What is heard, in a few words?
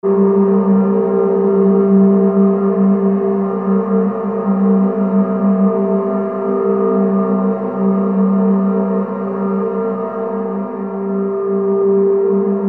atmo metallic drone